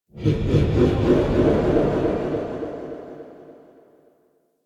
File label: Evil Spirit; Peculiar Mod